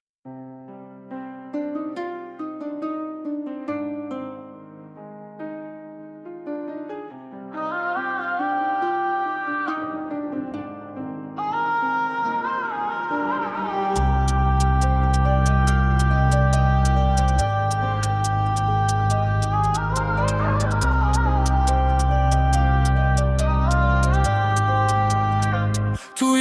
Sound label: Atmospheric pads followed by a heavy 80